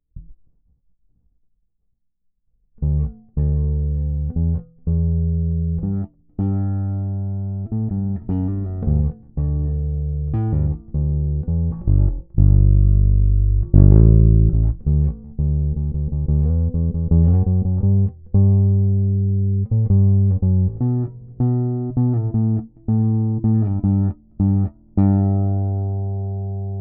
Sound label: bass chorus